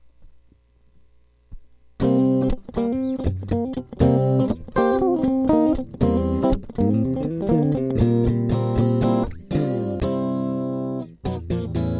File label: guitar